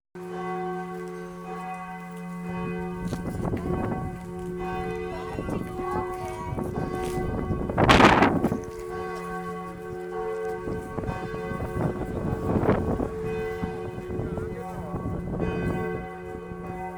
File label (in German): Glocke; Alt Meteln-Cramon-Gross Trebbow; KIRCH STUECK